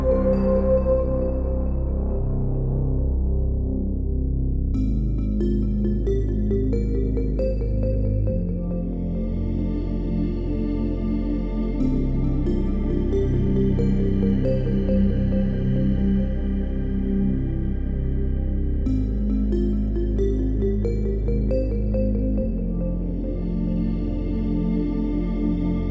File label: Blip Saw, Pads